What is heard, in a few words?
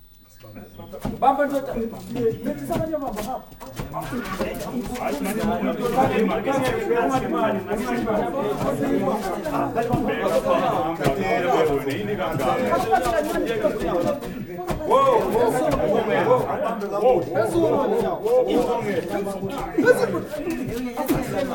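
AFRICAN CROWD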